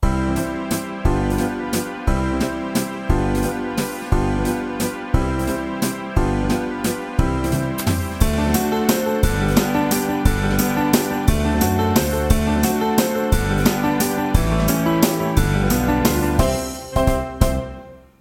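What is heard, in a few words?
Viennese Waltz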